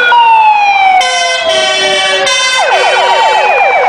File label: renault; szirena